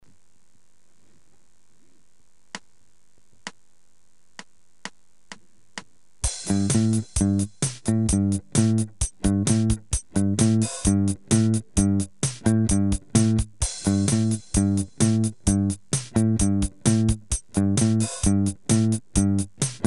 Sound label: Bell Bassy Riffs 130; Rhythm